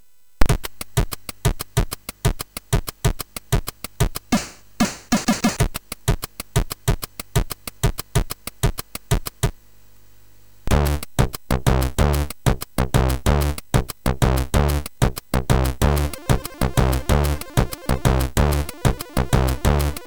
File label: sid MOS6581R2